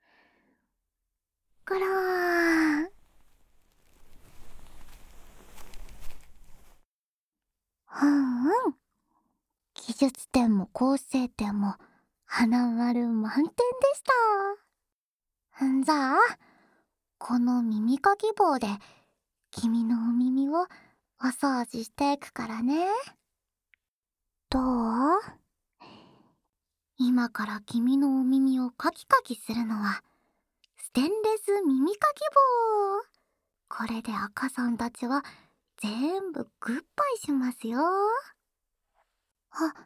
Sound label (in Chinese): 成为耳朵美人吧（右耳）